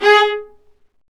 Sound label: String Sections, Violas Marcato; Roland LCDP13; STR Vas1 Marcato